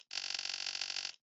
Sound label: slider; kena bridge of spirits